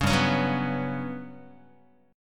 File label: G Major 7th Flat 5th, Guitar; GM7b5 strummed